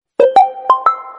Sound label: Notification Sms